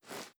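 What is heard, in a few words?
assault rifle reload fast arm; gmod; viper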